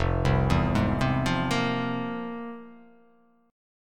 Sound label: F-Minor Major 11th, down; FmM11 arpeggiated; JGuitar